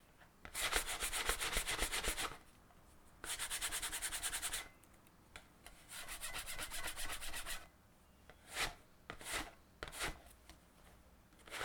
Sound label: Scrubbing